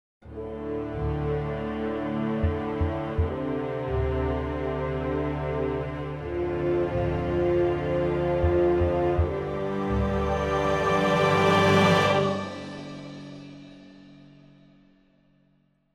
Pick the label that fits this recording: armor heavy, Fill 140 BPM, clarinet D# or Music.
Music